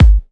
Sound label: Trance Kicks, Bassdrums